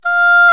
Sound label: Oboe, Orchestral Winds; Roland LCDP04 Orchestral Winds; Oboe 4-8; Oboe f 5